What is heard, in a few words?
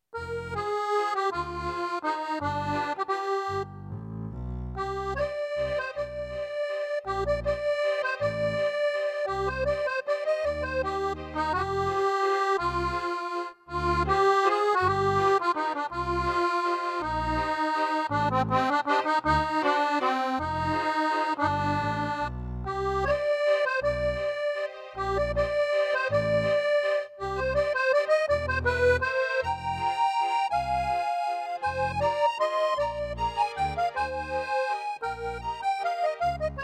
Waltz, accordion